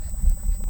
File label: Hydrophone